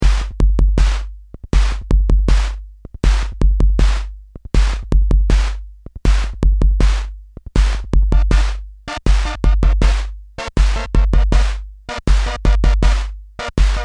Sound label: polymoog tempo; radioace; By Mike Walters - Chapel Hill, North Carolina